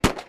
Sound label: shot; air wolves